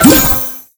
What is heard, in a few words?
daily bonus card land